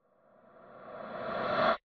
water swell; soundscrate

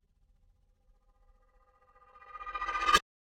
metallic reverse stutter no tail; soundscrate

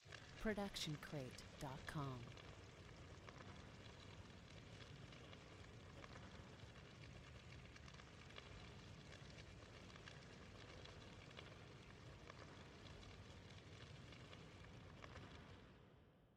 dry fire; SoundsCrate

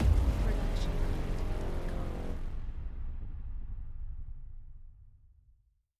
Cinematic Braam

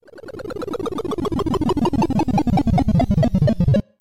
downshift; video game; video game bundle; soundscrate

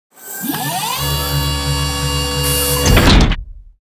Robotic Movement; SoundsCrate

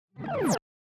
Bleep Charge Down; SoundsCrate

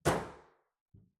Metal Footfall; soundscrate

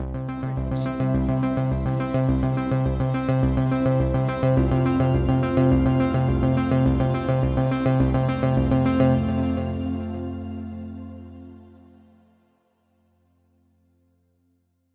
news feeds; soundscrate

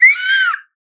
slide whistle scream

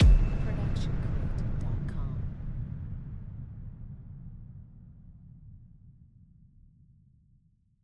Epic Hit; soundscrate

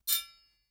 sword hit; soundscrate

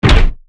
Robotic Footstep; SoundsCrate

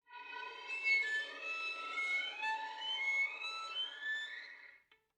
Violin Slide Up

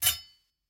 sword hit; soundscrate

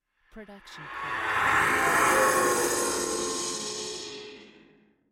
slow fly by

